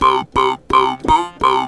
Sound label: talk box boom